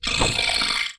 ghost armor attack; propack; magic

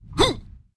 daemon attack; propack

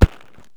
stickfighter attack; propack

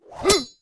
swordman attack; propack

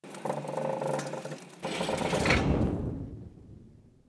catapult attack; propack

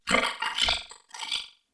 ghost armor die; propack; magic

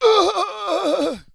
swordman die; propack

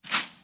defense tower attack; propack

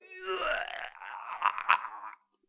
archer die; propack

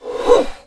summoner attack; propack

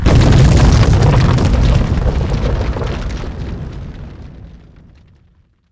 magic building fall; propack; archmage tower

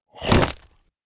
behemoth attack; propack; magic

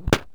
stickfighter attack; propack

